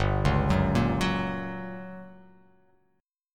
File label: G Minor Major 7th Flat 5th, G, down